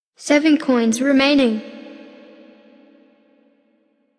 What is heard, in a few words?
coins; unicorn